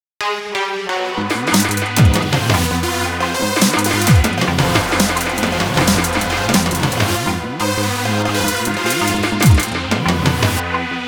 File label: DNB